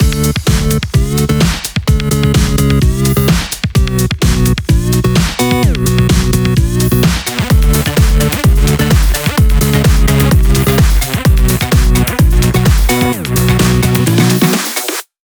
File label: 128BPM Mix; Vengeance Samples; VFH1 128BPM Daylight Kit